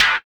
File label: BUZZER; Spectrasonics; Metamorphosis